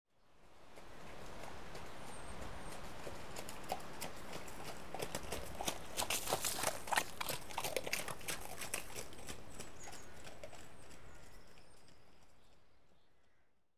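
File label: Horse Canters Past On Muddy; Horse Canters Past On Mdduy Fores; Animals and Birds; BBC Sound Effects Library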